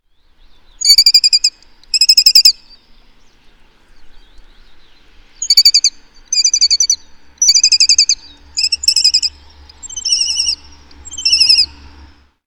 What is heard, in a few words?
The Lesser Kestrel; Kucuk Kerkenez